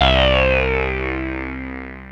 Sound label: Anasound Long mid C, Analog Single Notes; Sonic Foundry (Sony Creative Software)